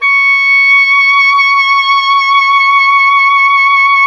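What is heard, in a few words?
Wind OBOE C; Miroslav Vitous - Solo Instruments VOL-2; Solo lnst. II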